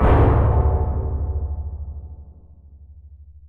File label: big clank